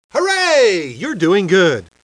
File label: HURRAY